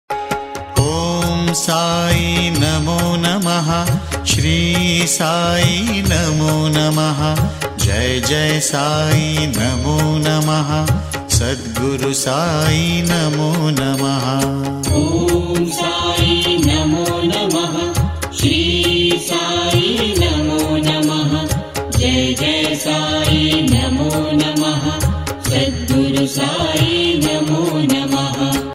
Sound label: Om Sai Namo Namah Ringtone